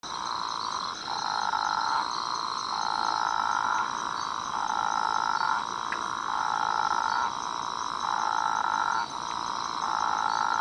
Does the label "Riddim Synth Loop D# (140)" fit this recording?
No